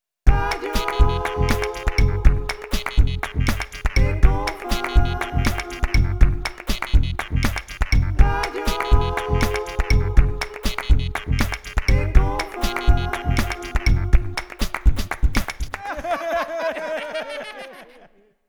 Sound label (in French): jingle rigolade